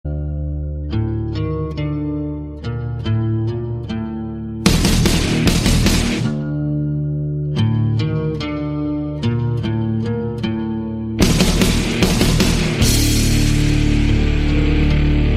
instrumental

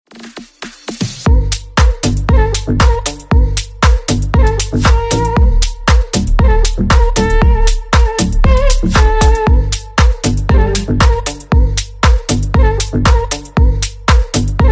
violin

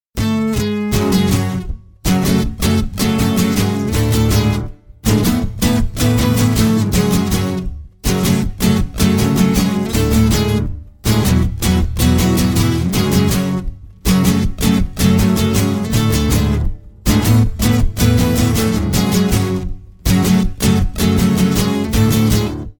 instrumental

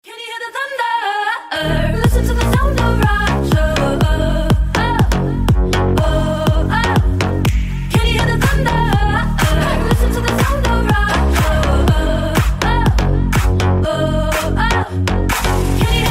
thunder

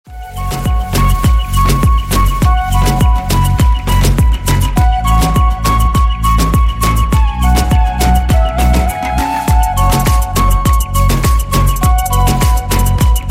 instrumental

